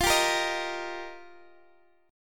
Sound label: F 7th Suspended 2nd Suspended 4th, Guitar DADGAD; F7sus2sus4; strummed; x,x,15,12,13,13